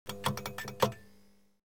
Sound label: Bulb Flicker